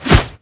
sword whoosh; arkane; weapons

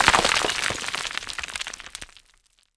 rock falling; arkane

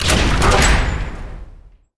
door huge stop open; arkane

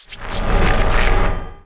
ring protect fire equip; arkane; character sheet

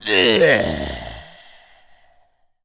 zombie death

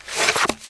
staff unequip; arkane; character sheet

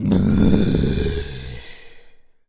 taunt, zombie

